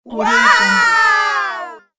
family group win wow; fxprosound

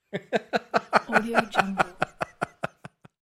male exaggerate fake laughter

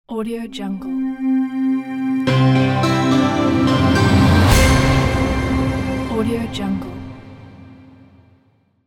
minimal intro logo

